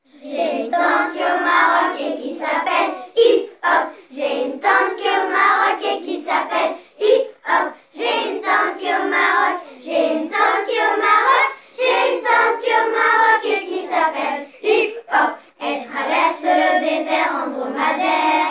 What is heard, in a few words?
Vocal